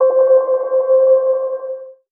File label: BELL (C); One Shots; Great Beyond; Cymatics; Mystery Pack Vol 4